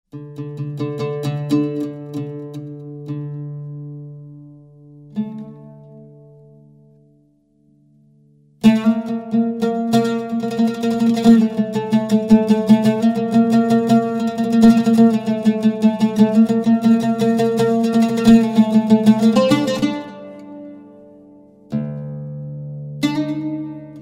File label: Whisper in the desert (Zemzemeh dar Sahra), Oud